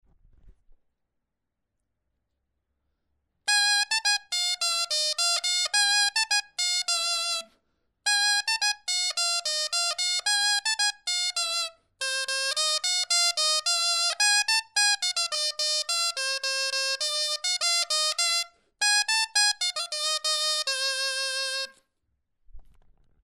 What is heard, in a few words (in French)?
clarin Bourrée; du couserans